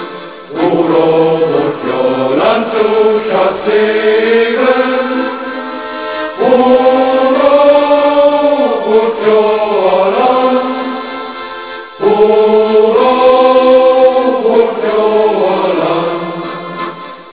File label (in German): hurra kurz